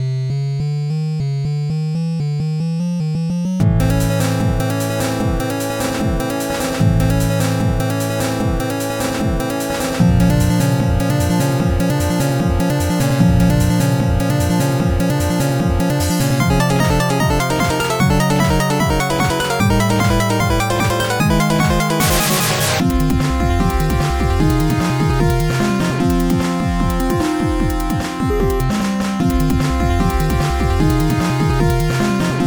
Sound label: minor boss